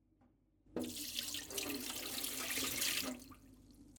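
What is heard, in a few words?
pouring out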